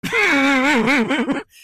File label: funny laugh